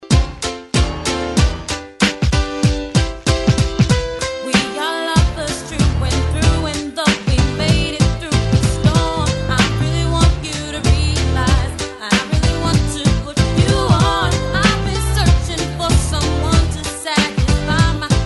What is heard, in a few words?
90s Intro 98Bpm; DJDOLLS